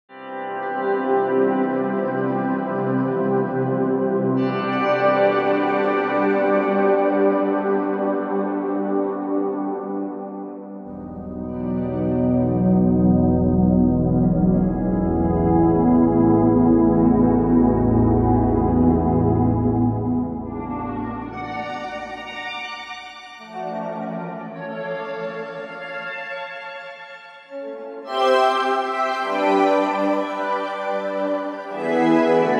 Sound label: Chordal Ambient Pads